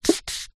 food drink coffee cup disposable plastic lid turn slide on cup